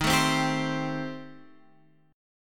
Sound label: A Minor 7th Flat 5th D sharp; Am7b5/D# strummed